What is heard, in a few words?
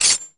sheath; gustavia